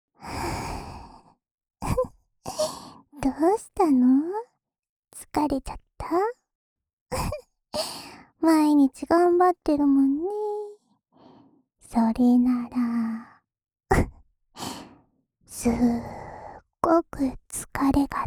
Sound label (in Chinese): 安眠用 浅浅的舔耳循环（右耳）, ASMR